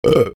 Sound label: burp